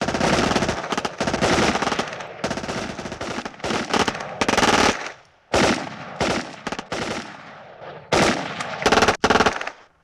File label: Machine Gun Multiple Long; Weapons & Firearms; Sony Pictures Sound Effects Series Volumes 1-10; Sony Creative Software